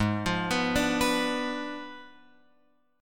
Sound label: Ab Diminished 7th Ab, Guitar, Open F; Abdim7 arpeggiated; JGuitar